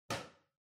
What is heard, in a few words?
Washer Front Load Far Filter Lower Cover Close